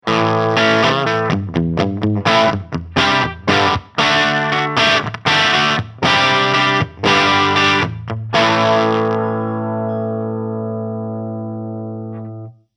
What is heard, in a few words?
Almost Clean; Sound City